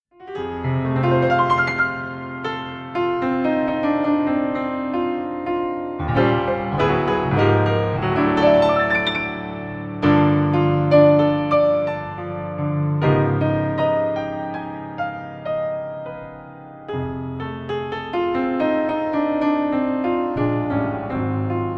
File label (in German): Piano-Meditation